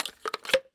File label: m203 insert grenade; ins2; weapons; handling